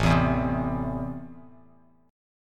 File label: A Minor, Bass Standard; Am strummed; 5,7,2,5; JGuitar